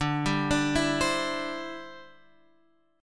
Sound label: D 7th Suspended 2nd Suspended 4th, Guitar Open F; D7sus2sus4 arpeggiated